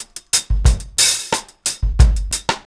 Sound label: RG90 DRUM, REGGAE; Best Service Advanced Media Trax Modern Composer